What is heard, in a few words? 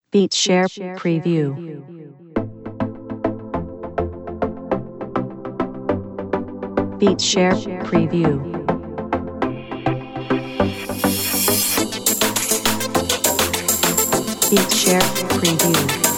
reggae 102 bpm